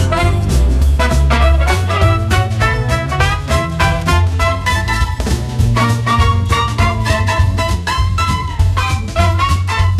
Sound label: Electro swing; musicgen